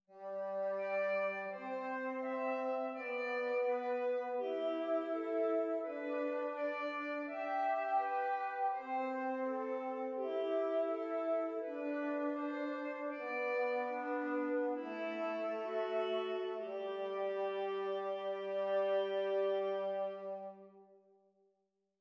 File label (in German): Zwei simmiger Contrapunkt; Ronge